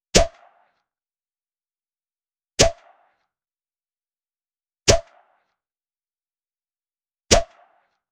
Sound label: pop sound effect, launcher